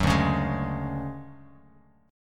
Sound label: D-Major Sharp 11th, D, Bass Standard; strummed; JGuitar